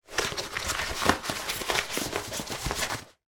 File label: cardboard sheet being rolled up; foley